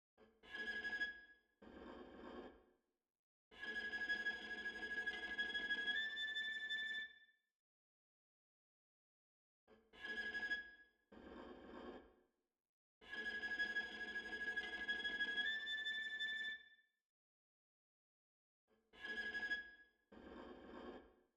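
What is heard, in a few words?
pattern, viola; devolution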